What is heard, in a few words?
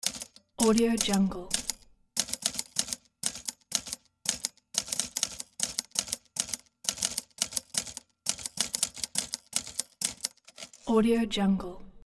typewriter